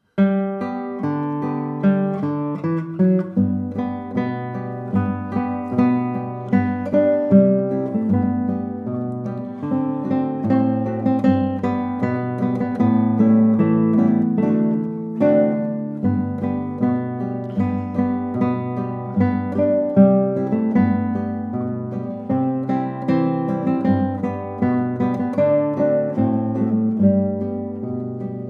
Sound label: Jingle Bells